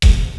RY-10, DRUMS + PERC, YAMAHA

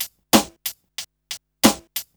BEAT, Burning Grunge Hip Hop

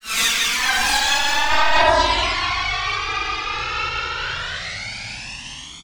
Rocket, Flyers; Zero-G Seismic Frequencies

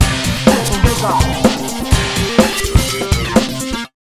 beat, Electro 125, Big Beat Drumloops

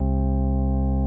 CX3 OVDRV, CX-3 organs; Greytsounds

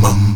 MUM G#2, Heart of Africa; Spectrasonics; STAC. MUMS

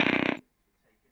Perc Lo Speaker, Stylophone Beatbox

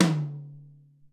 10 Tom, Alesis SR-18

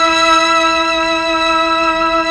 STR, STRING

